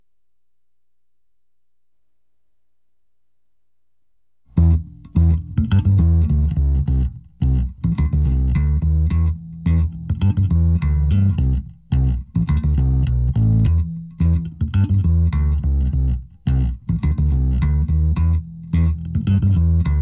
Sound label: rhythm